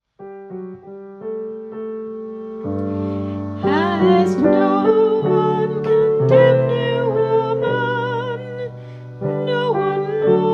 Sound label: Communion Antiphon; 5th Sunday of Lent (Adulterous Woman)